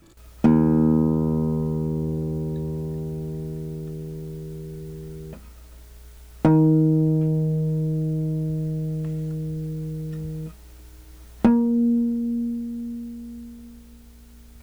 E2, 撥弦; 弦鳴楽器

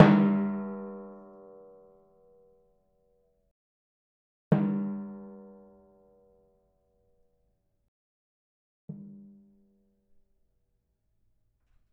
Timpani 奏, 膜鳴楽器 打奏; Timpani-奏法と打叩強度による違

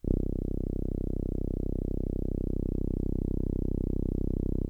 ミニ・モーグ, 電鳴楽器

